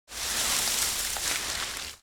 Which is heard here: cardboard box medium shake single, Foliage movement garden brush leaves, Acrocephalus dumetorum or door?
Foliage movement garden brush leaves